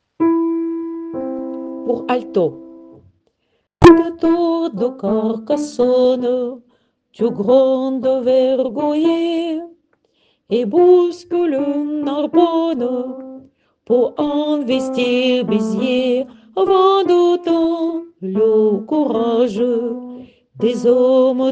Vent d'Autan couplet Alto

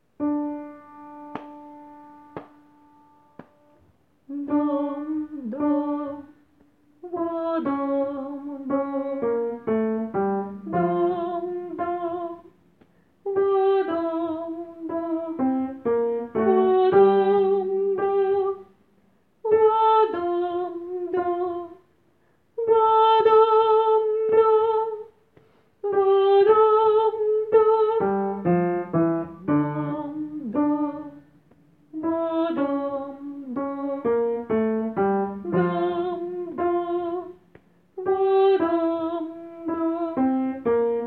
Sarabande alto

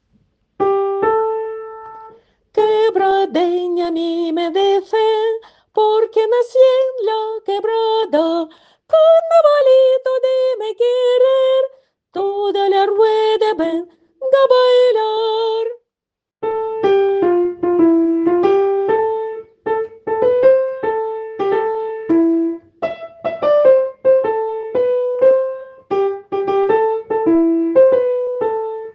couplet soprane; Carnavalito

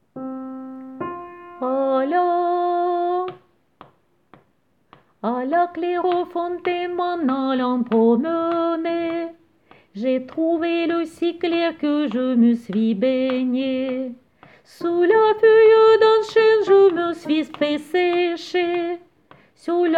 A la claire fontaine Alto

